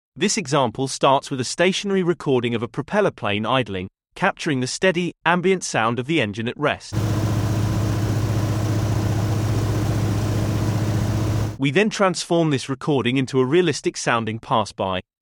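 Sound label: propeller plane sound effect; spintracer; tonsturm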